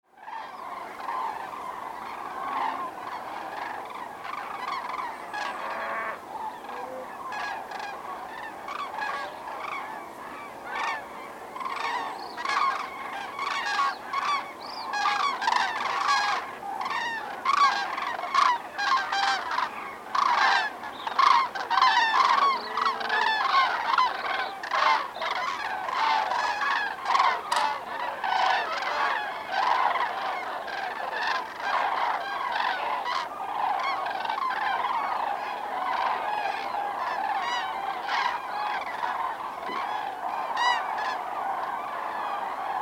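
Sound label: common crane flight roost; linumer-teiche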